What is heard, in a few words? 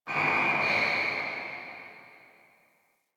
Distant Shoe Squeak G; Warframe; Ambience; NineteenNinetyNine